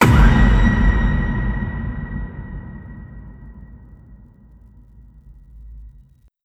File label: halloween impact